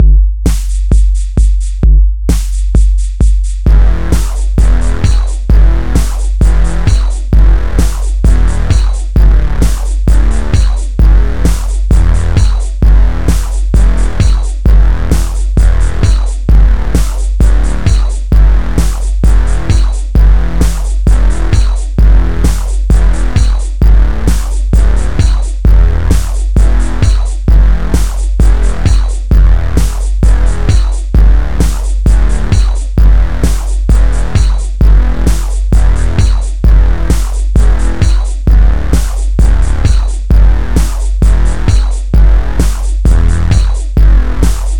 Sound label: instrumental